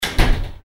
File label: door